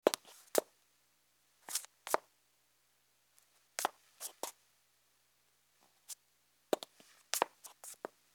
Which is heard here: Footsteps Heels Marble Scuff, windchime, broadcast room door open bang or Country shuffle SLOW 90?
Footsteps Heels Marble Scuff